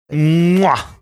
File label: mwah